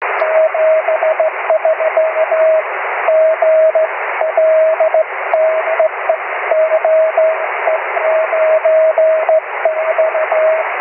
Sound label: cw, 23cm